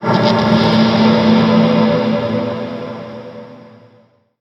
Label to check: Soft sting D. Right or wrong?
Right